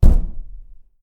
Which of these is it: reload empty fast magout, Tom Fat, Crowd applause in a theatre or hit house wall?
hit house wall